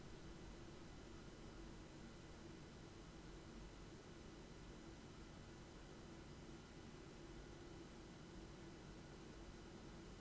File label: Noise